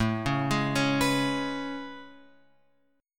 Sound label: A Major 9th A, chord; AM9 Chord {5 4 6 6 X 7} arpeggiated